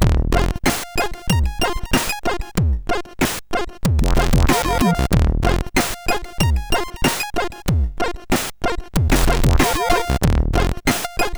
Dancehall sid CSG8580R5

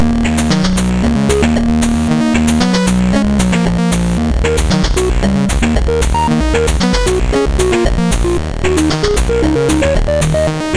sid MOS6581R4, GAMES; Break Dance

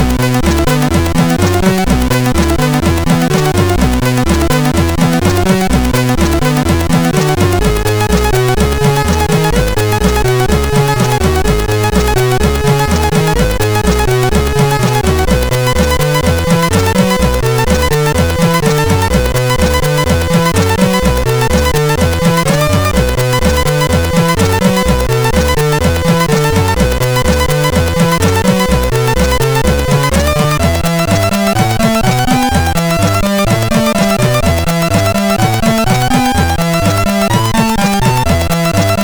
Rock Tune, MOS6581R4

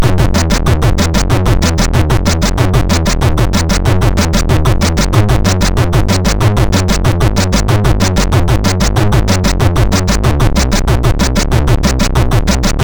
Old Music, MOS6581R2

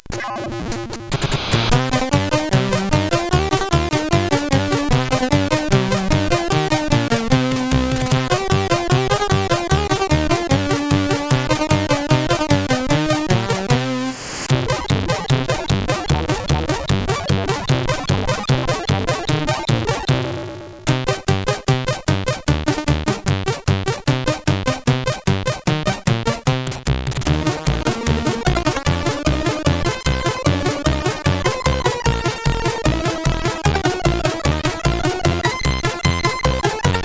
Russian Cow Moolok sid CSG8580R5

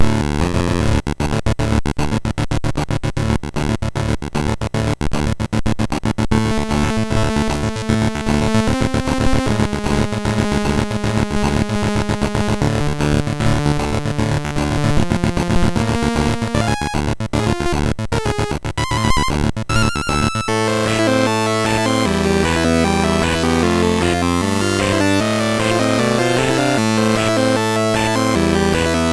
sid MOS6581R3, GAMES; Delta Man

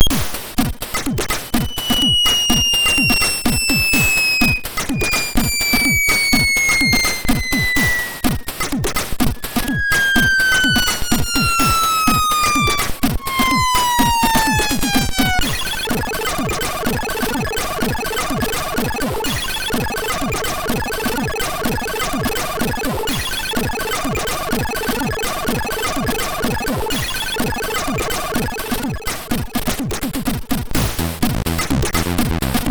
Bass and Drum, MOS6581R2; sid; hvsc

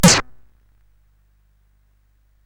Tecnetium, CSG8580R5

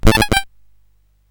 sid CSG8580R5, GAMES; Card Sharks

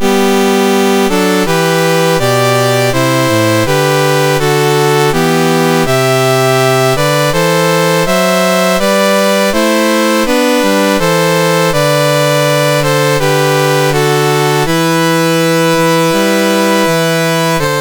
MOS6581R2, National Anthems; Georgia; Dave Marquis

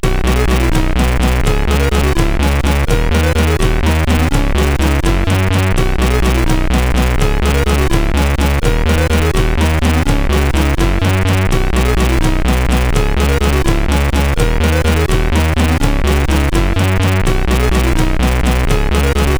Xmas Rock, sid CSG8580R5

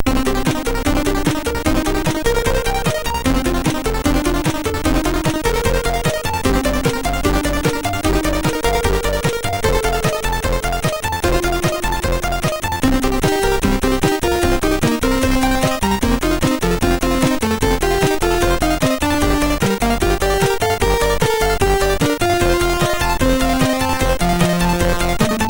Humming a Cheesy Melody, MOS 6581; MOS6581R3

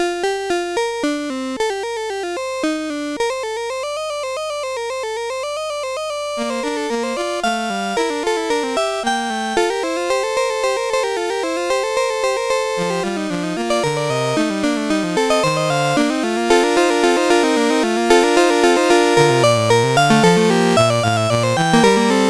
Fugue, CSG8580R5; Fugue No21

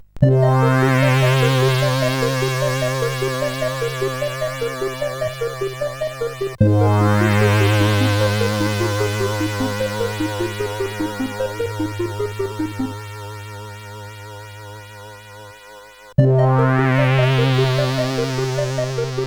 CSG8580R5; hvsc

